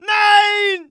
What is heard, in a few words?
hit, pain; mohaa